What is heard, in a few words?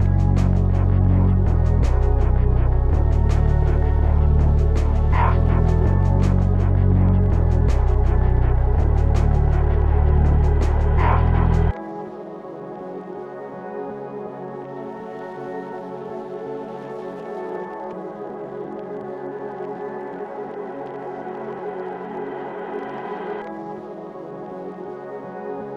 BPM 164